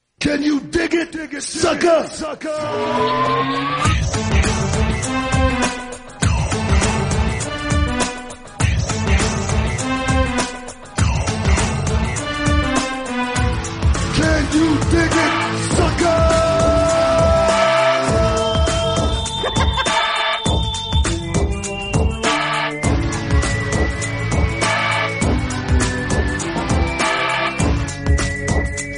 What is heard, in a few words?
Ringtone; WWE Booker T